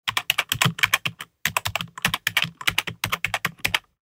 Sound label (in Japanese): キーボード タイピング音